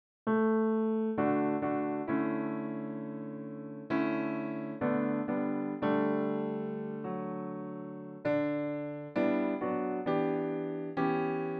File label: D MAJOR piano sound; REHEARAL AIDS